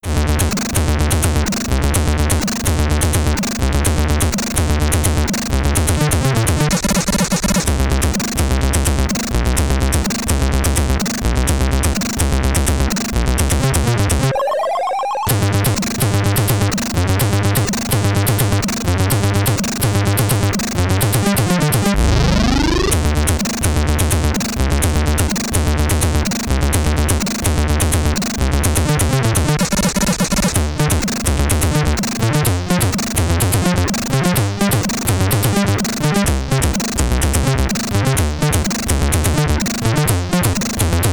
SID Stereo, commodore 64; Hawkeye; Level 1 First Stage, Level 8 The Temple

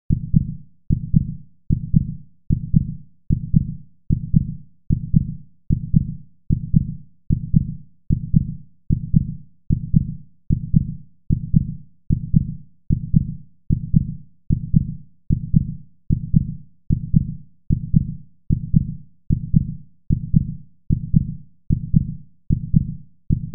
Heart Beat; fractured minds

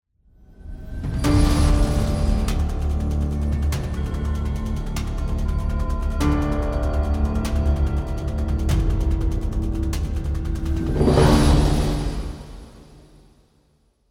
Field BGM; Xenoblade Chronicles 3

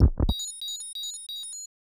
Fanfare; Lucasarts

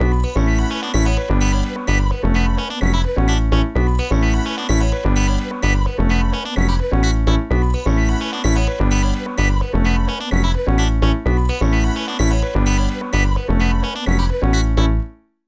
Electronic; Dream Dancer; NDS

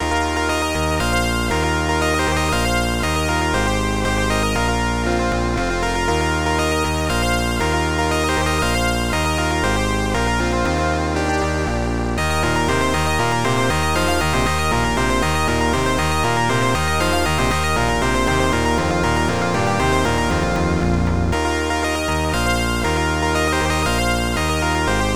Victory!

